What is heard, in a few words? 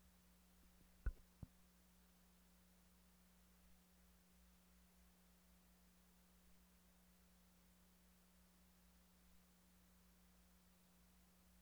interference condensor phantom battery